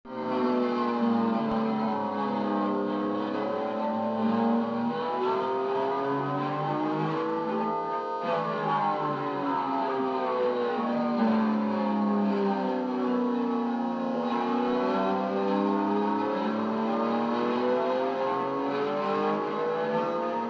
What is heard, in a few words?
guitar screwdriver